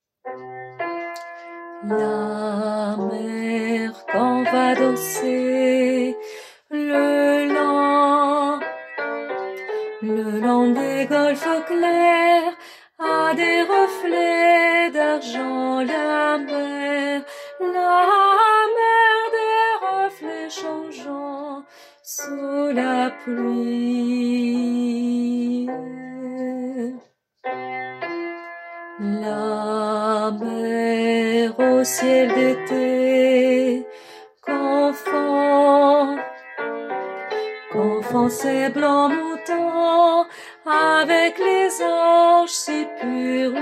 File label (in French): alto